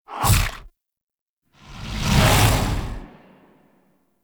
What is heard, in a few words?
Throw Off Screen Dash; Umbra; Warframe; Sacrifice; Sacrifice Arsenal